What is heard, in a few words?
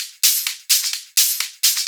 Hihat Loop 128 BPM